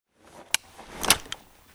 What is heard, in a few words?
Inf Weapons Foley; Red Orchestra 41-45